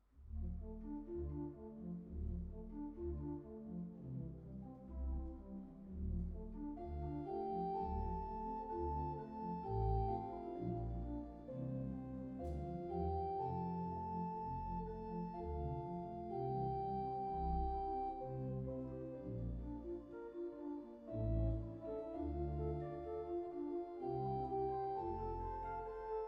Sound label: mictest organ, microphone comparison cardioid; neumann